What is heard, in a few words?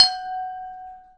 glass camerton ish, crystal glass strikes; UNUSUAL KITS and FX